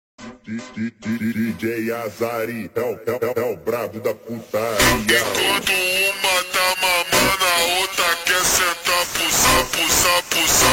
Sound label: slowed reverb